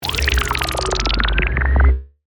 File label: Stinger Filter, Stinger, Filter, Descend, Liquid, Accent, Transition; Production Element, Imaging Element; BlastWave